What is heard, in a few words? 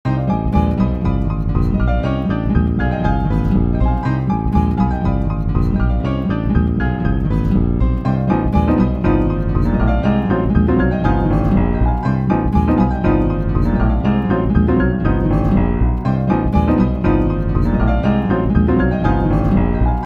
Intense Chase Pianos